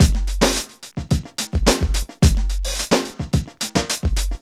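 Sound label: 108 bpm, Funky loops; Zero-G; Funky Elements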